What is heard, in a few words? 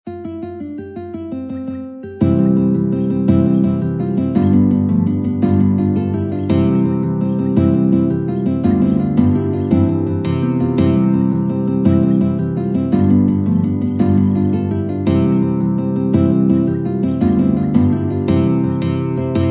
irish folk tune guitar quartet